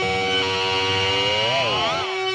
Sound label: Processed Guitar, Loops; Guitars